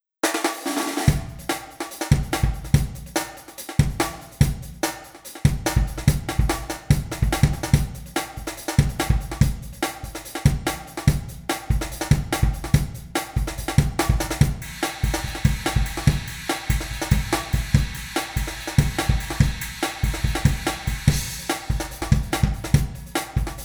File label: beefy room tuned high rod (drums only); tascam